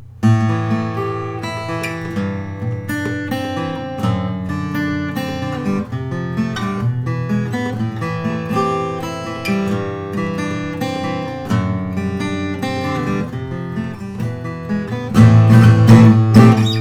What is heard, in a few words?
collings 03 12 fret; natural